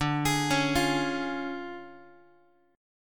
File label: D Minor Major 7th Flat 5th D Guitar; x,10,11,11,9,x; DmM7b5 arpeggiated